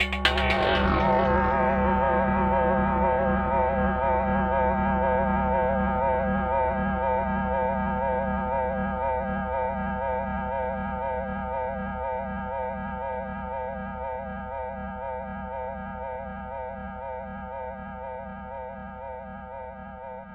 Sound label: D- Singing Bowl